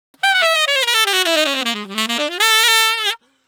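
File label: 145 NuJazz Sax A major; Digidesign; Pro Tools; Pro Tools Essential Loops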